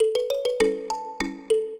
minuet, mbira; Mozart

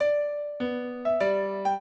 trio, piano; Mozart

